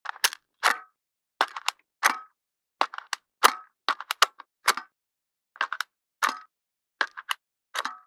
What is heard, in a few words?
toy gun cock; weapons; NS Studios - ultra sound collection